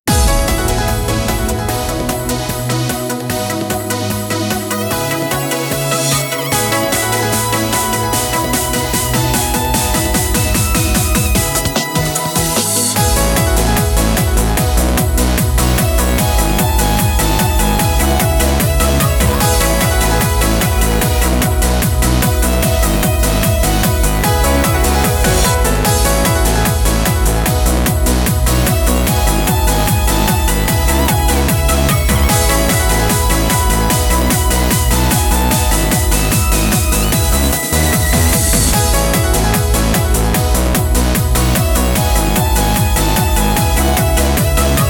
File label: Music Event